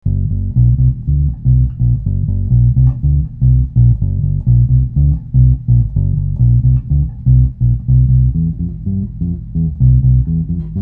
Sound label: bass